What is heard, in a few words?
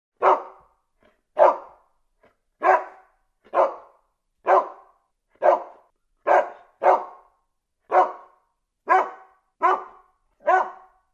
collie, dogs